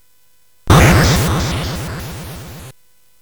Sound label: Dark Castle, CSG8580R5